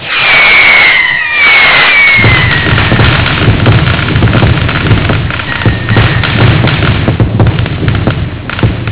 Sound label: fireworks